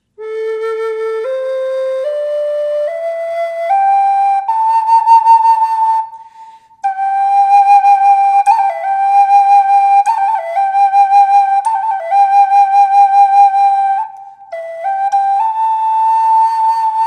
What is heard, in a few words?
reverb, flute